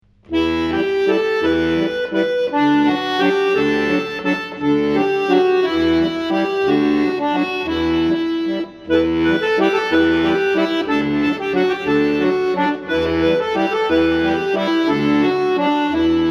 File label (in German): Akkordeon Arrangement